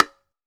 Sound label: st bongo; AKAI S-Series CD-ROM Sound Library VOL-5